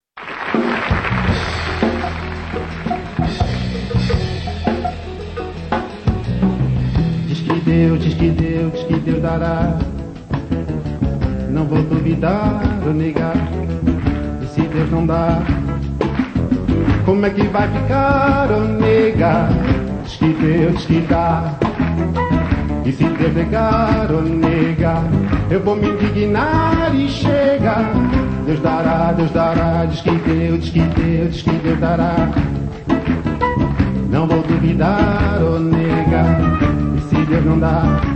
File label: Partido alto